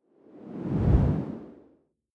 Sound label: whoosh simple long low; swooshes-swishes